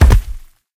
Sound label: step, procoptodon; zoochosis pack1